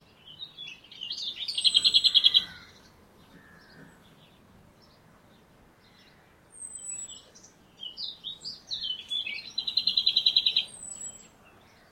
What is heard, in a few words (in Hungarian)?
kis poszáta hangja